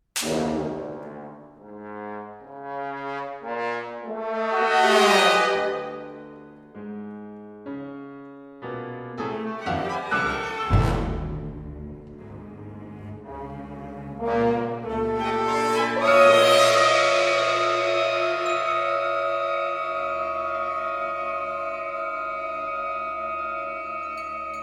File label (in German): Konzert; MAmann